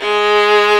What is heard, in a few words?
VIOLIN G#2; AKAI S-Series CD-ROM Sound Library VOL-8